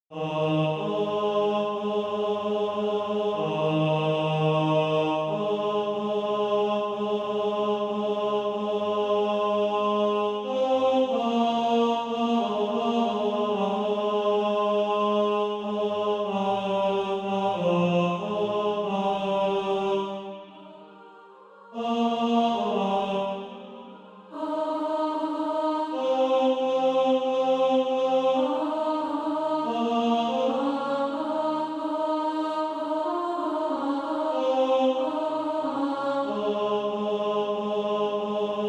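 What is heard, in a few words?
tenor, Practice Music; SATB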